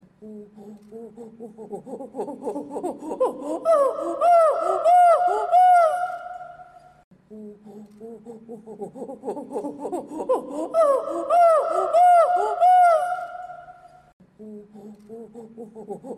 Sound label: Chimp Greeting; Jane Goodall